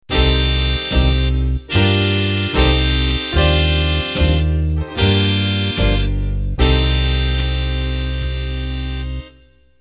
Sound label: Band End